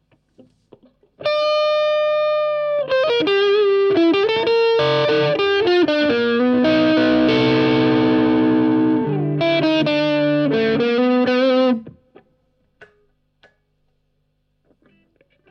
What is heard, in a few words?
standard gain middle tone volume out